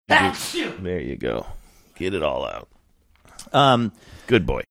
sneezing saying there you go get it all out good boy